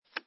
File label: fa pai